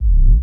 Bass Short Hit

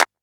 CLAP & SNARE

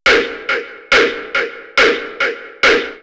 140 CHANT LOOP

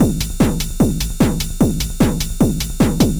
Beat Loop 150bpm

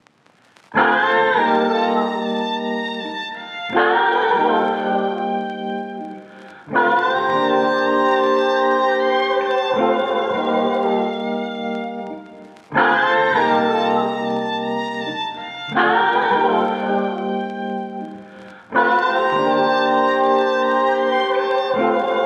80 melodic loop Bb; fire

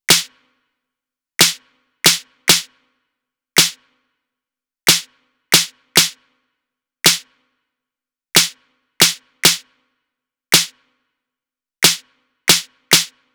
Snare, 138

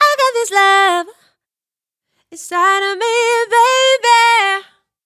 Gm Phrase I Got This Love Dry, 120

